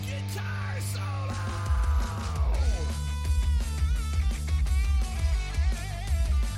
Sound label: guitar solo